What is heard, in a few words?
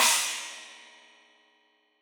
China, Crash, China Cymbal